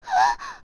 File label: Gasp; Adult Zelda